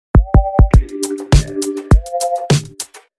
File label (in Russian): сбивка; audiobrending dlya podcasta